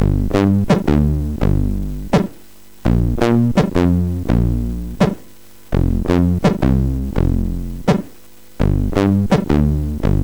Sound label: Bass, MOS6581; sid